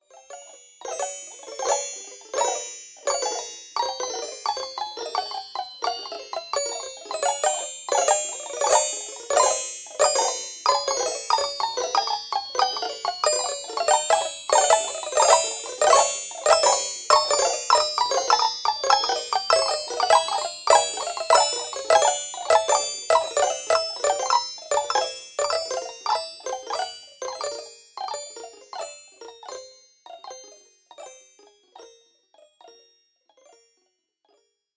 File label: Chimes Haunted Jingles, Windchime